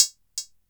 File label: chink, Korg Electribe ER-1; Kit 4